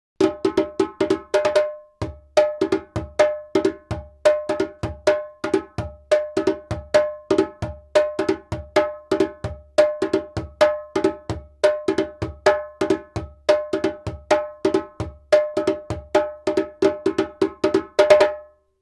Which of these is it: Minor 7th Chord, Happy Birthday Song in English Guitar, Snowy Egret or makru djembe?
makru djembe